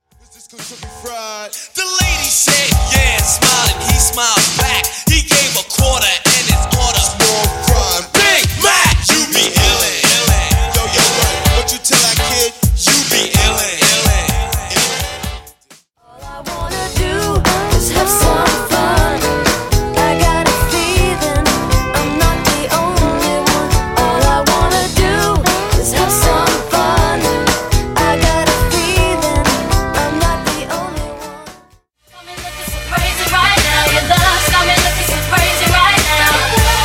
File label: HipHop RB Pop Old School Edition Mixes 127 90BPM; Clean Dirty